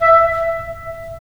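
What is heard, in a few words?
wind clarinet; LA Composer vol. 3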